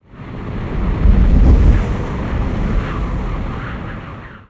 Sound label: arctic wind gust